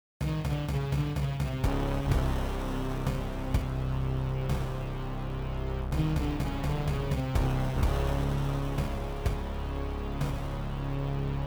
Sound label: menu music